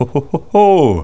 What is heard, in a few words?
HO HO HO HO